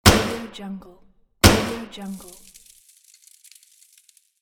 petard

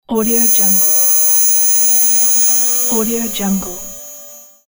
reveal

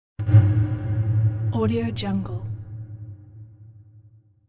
deep echoey impact hits; hollywoodedge

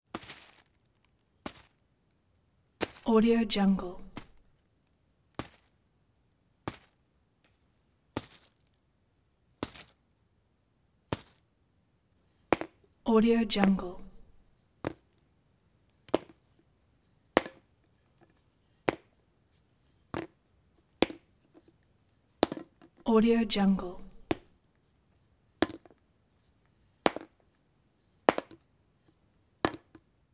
gumball basket dropping into easter basket

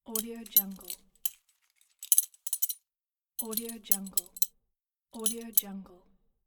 metallic buckle

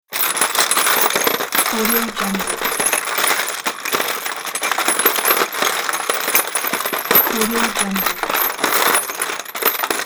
socket wrench rustling

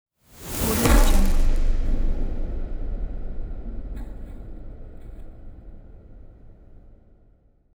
metal sweep impact

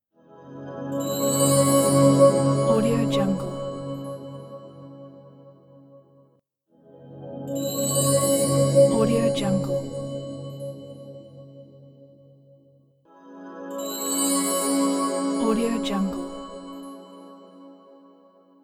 magical appereance logo

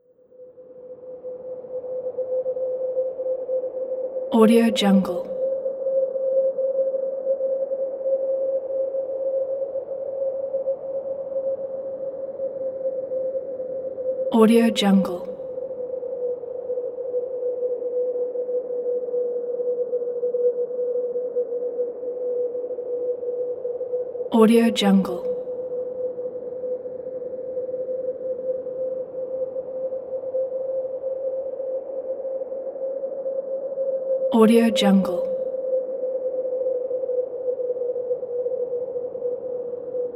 whistling planetary ambience

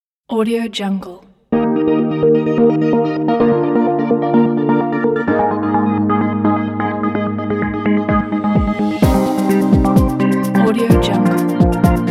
corporate sparkle

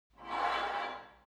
sport gym take off weight barbell reverby quick

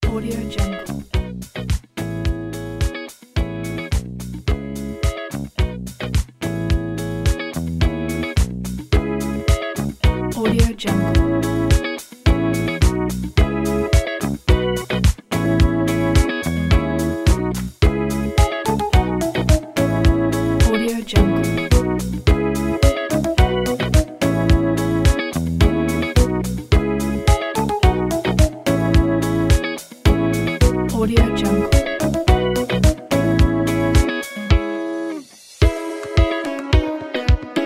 modern disco

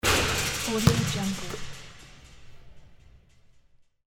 basketball hitting backboard; medusamusiclab

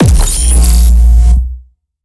textures the cruncher; sinematic 2; soundmorph

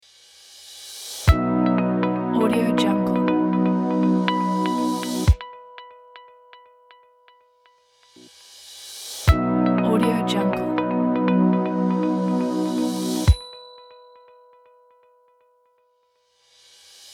elegant corporate logo

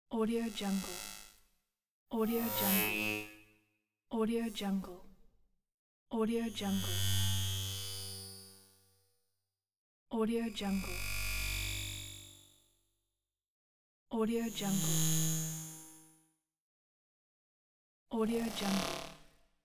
electric static glitch whoosh

